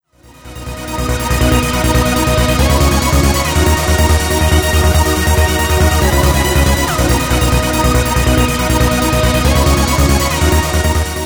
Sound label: Club Trance; trance loops